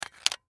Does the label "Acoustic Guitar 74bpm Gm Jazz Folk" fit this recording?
No